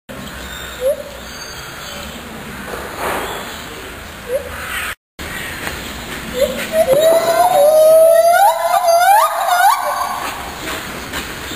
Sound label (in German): gibbon gesang